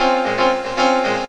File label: P. CHORDS, KEYS